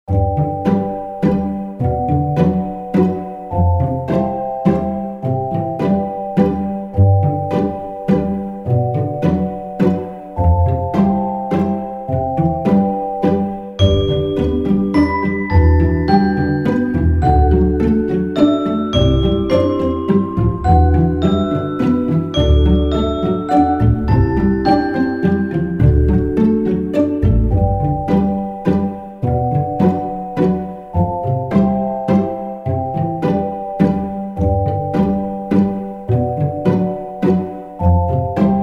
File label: Slow Waltz; Party Planet